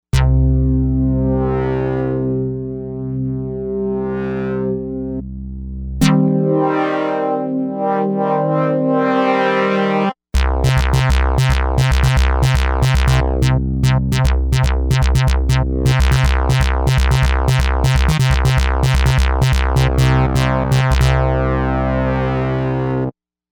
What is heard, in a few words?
Arcade Racer, Novation UltraNova; Factory Patches